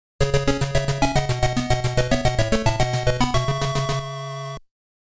Round Clear

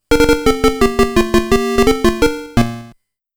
RETRY

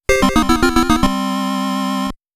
Jingle

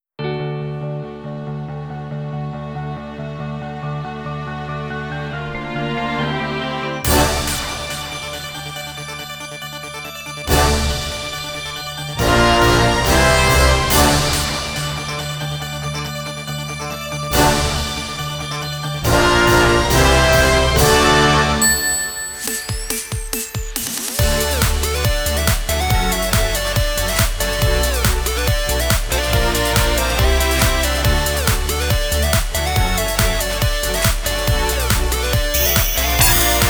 Friends Orchestral Ver. Live

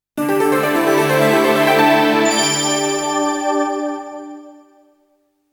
Sound Logo

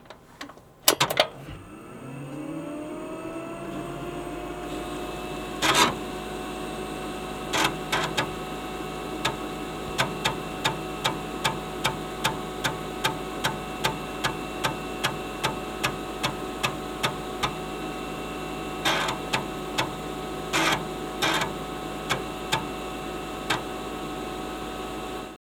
Boot and Access Floppy Disk Drives; 2D ver.

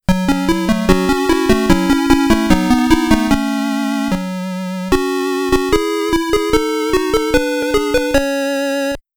Jingle

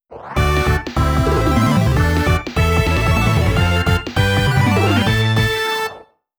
Time Attack Clear New Record

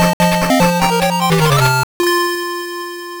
Stage Clear

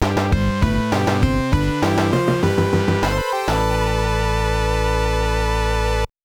Stage Clear

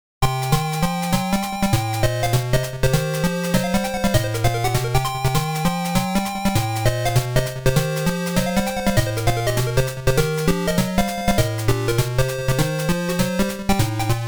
STAGE BGM

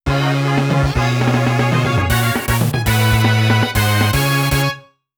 Special Stage Clear

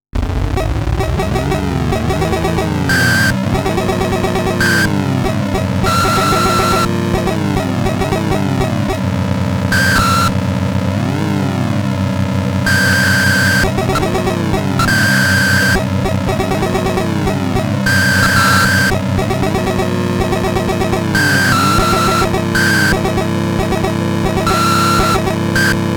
Gameplay Sounds; Head-On

